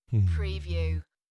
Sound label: Male humming; beanstalk audio